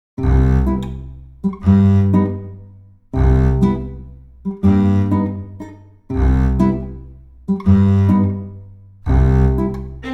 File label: chill